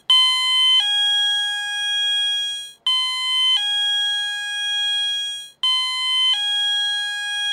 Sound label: Tone